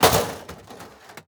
plastic break

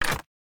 Switch

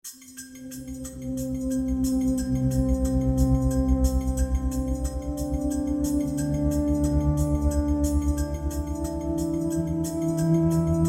cage chase

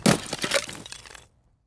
ice break; teardown; ps5 windows xbox series xs

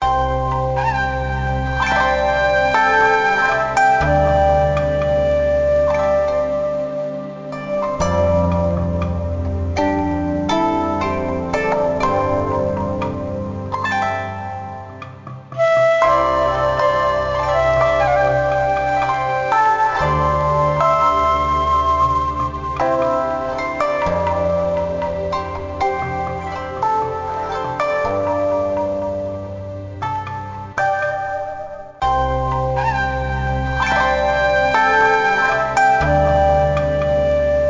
MUSIC LEVEL

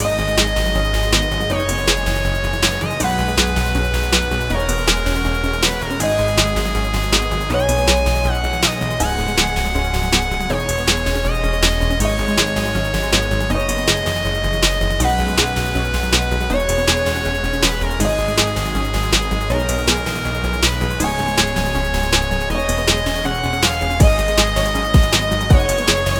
Music Ending 160bpm; Sockventure

